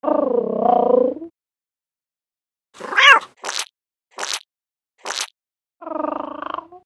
Leopard Idle

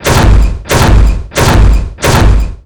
Walk; Albireo; Gamigo

